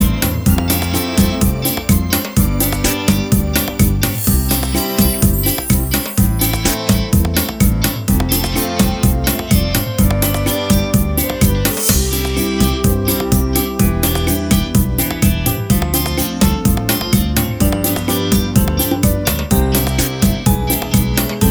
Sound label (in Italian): vers bachata